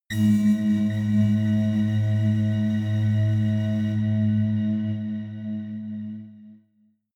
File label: synth hum echo, drones